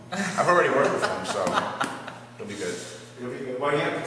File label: new laugh low; Gachitale